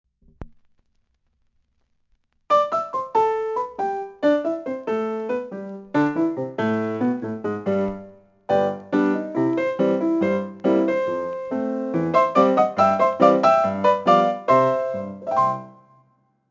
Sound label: grammophone entertainer; Jack Keane 2 The Fire Within; Windows gamerip 2012